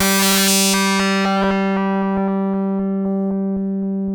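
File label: sample and hold G1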